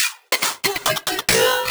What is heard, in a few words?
Fill 140 BPM